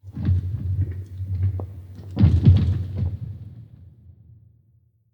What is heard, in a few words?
Random Ice